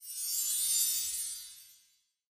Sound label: substitution Symbol Burst Shimmer; shangrila